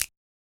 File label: Yamaha DJX 2B, Snap